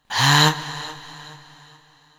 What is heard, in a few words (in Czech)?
dalsi vzdech